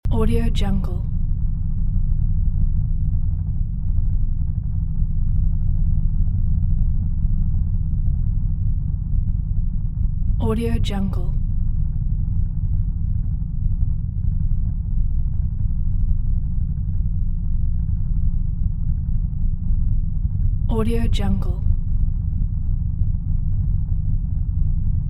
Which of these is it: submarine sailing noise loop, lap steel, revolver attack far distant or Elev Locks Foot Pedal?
submarine sailing noise loop